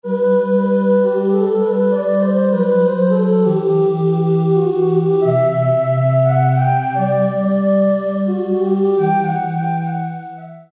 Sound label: SYN. VOX, Guitar And Keyboards